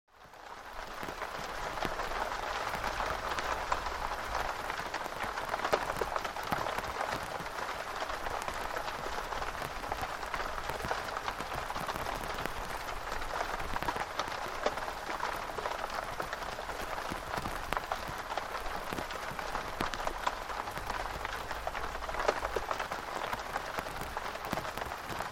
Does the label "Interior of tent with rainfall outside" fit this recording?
Yes